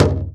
BASS, INDIAN PERC

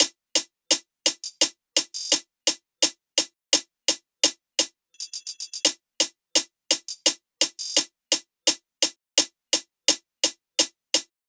loop hat 85; Seismic Loops

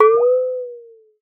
water; Xsample VOL-9

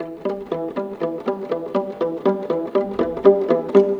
Viola Pizz, Cinematic; Sonic Foundry (Sony Creative Software); Orchestral Series VOL-3

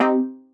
Fm Dub Chord

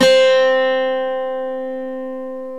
GTR, Dual Guitar, Ac 12+; Club-50 - Foundations

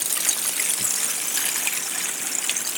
Icy Stream, On The Glacier

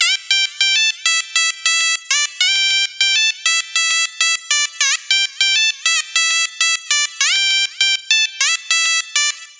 100 E Seq Transistor; Zero-G; Future Wave

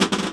GUERRA LIK, 3-D Percussives, Ethnics; E-MU Producer Series Vol. 5 – 3-D Audio Collection

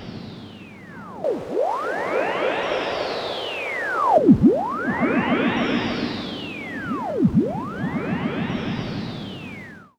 Filtered Noise, radio signals

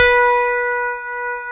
CP70 E6, YAMA; East West; Global Sounds

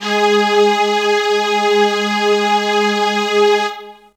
Strings E4; Zero-G; Total Drum Bass

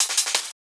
D&B Rolls, Drums; neuro

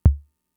Kick, Drum Machines Fricke MFB-522

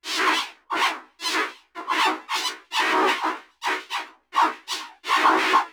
Alien Chatter, sci-fi

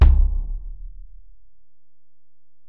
Ac BD, Alesis DM-PRO; 300 Drum Machines

